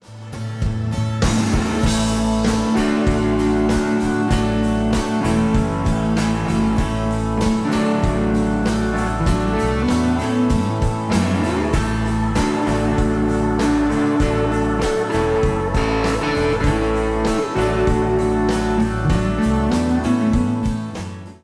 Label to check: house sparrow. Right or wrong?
Wrong